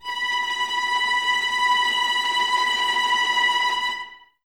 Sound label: VLS B Tremolo, Violas, String Ensembles; Miroslav Vitous